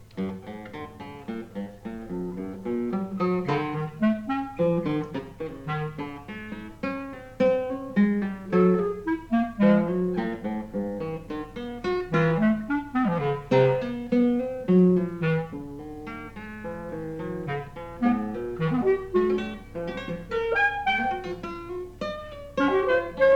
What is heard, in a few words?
Clarinet and Guitar; conversational piece